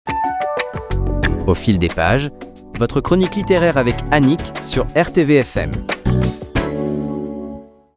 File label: JINGLE; Au fil des pages